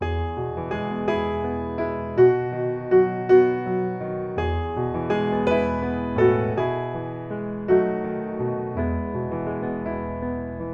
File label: piano